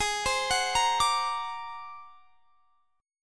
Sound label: G sharp 9th Flat 5th G sharp; G#9b5 arpeggiated; x,23,22,23,23,22; JGuitar